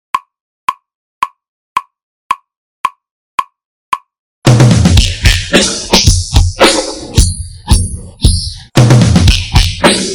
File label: Drums